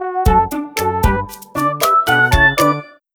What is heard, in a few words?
Home Menu Jingle